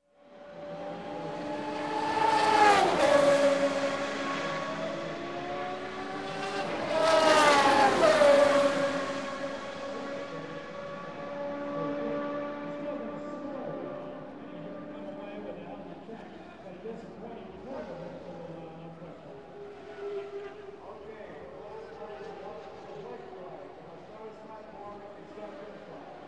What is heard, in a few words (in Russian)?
finishnaja prjamaja formula 1